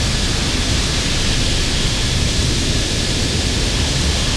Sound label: F16 Ext; Falcon4